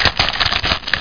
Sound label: shuffle; Monster Media Number 15